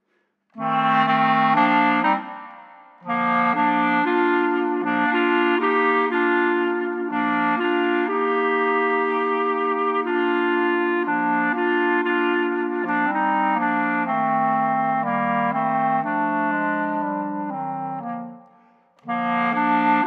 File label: Clarinet Trio